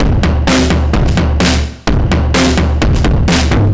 Slack Drums A, 128bpm, Drums n Perc, analogue circuit; musicradar